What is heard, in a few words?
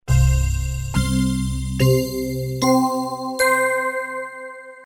d50 heaven; commodore amiga